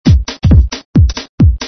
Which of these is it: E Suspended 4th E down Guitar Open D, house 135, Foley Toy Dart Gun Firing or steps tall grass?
house 135